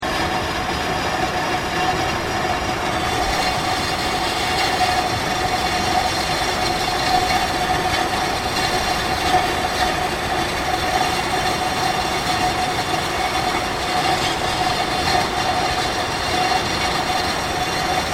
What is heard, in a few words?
Pumping Station Hot Spring Steam Valve, Japanese hot spring pumping system; Machinery; Machines